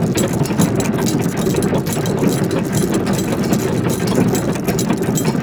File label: Galleon Gear Loop; Warframe; Ambience; Grineer Galleon; Galleon Props